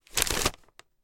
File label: Paper Grab Quick; paper mvmt